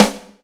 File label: XV Snare, Roland XV-5080